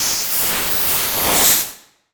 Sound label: Spray